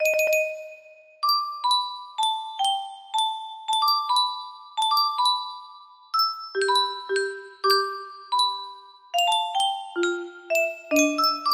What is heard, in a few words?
musicbox